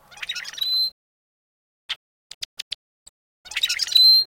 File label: Rusty Blackbird